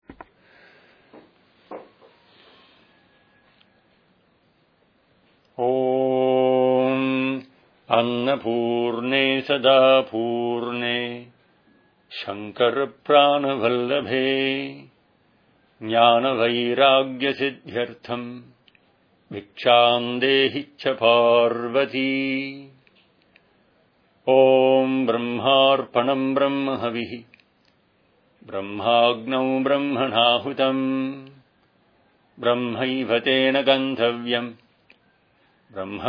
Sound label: Prayer Before Eating Chanting; Annapurne